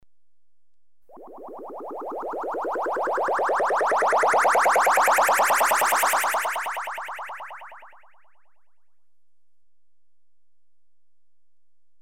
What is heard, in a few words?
Bubbles (rising pitch), Electronically Generated Sounds; Sound Effects Library